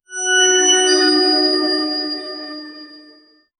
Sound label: a rising burst of clean; peak